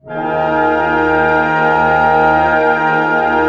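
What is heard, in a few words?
pentatonic D# pianissimo, orchestra; Sound On Sound - Peter Siedleczek's Orchestra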